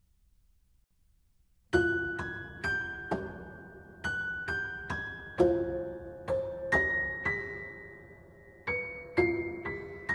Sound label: Sonatas and Interludes for prepared piano; John Cage